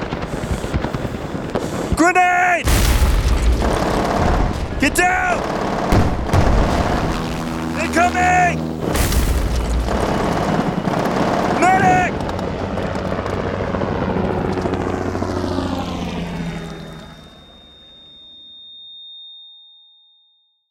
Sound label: Battle Field Efforts